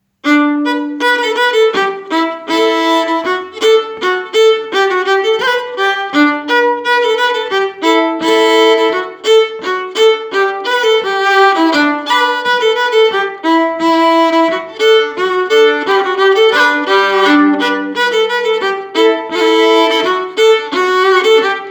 Polka; du musico

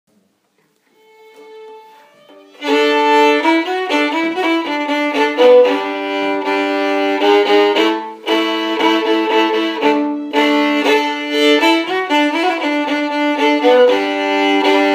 Voix II, violons

